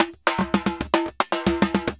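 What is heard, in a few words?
TIMBA SALSA, salsa drums and percussion; Best Service ProSamples vol.14; AKAI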